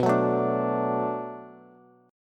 B Minor 7th B Bass, chord; Bm7; strummed; JGuitar

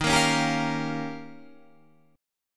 D sharp 9th D sharp, Guitar Standard; D#9 strummed; JGuitar

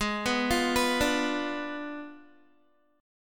Ab Diminished 7th Ab Guitar 4E,4A,5D,5G,5C,6F; arpeggiated; 16,14,15,16,14,x; down

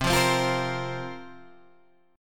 Bb Suspended 2nd C

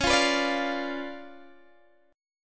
C sharp 7th Flat 9th Guitar DADGAD, chord

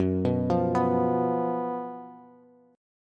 Gb Major 7th Suspended 4th Gb Bass; GbM7sus4; arpeggiated; JGuitar

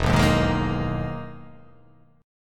E-Major Sharp 11th E

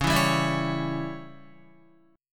Db Diminished Db down Guitar; strummed; JGuitar

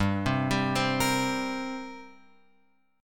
G Minor Double Flat 5th, G; Gmbb5 Chord {3 3 5 5 X 6} arpeggiated

